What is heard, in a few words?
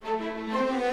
Music Fight Pirates Theme Easy Bpm128 Start; asterix obelix slap them all 2